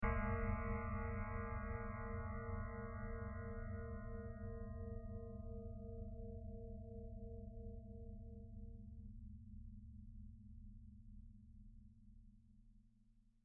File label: gong